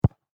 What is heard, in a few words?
Soccer Ball Land On Grass Distant; Sports